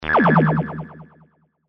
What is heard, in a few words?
Transporter, Scifi, Transporter, Rematerialize, Science, Fiction; Spacecraft Sound Effects; BlastWave